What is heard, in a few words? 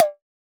TOMM, Drum Machines, Akai MPC-3000